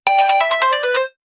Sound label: wrong answer for game; quiz